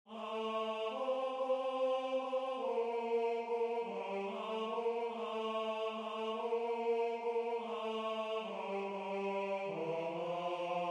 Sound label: Tenor